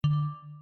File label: Error